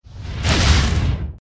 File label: Fire Spirit